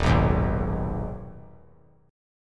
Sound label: Eb Suspended 4th Sharp 5th Eb 3C 3F 3A 3D; Ebsus4#5 strummed; JGuitar